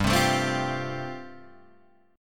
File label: G 9th Suspended 4th G down Guitar Open G